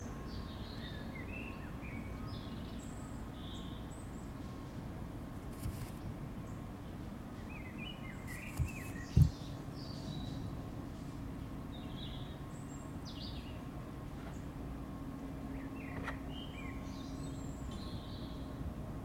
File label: stereo spring soundscape from my garden in may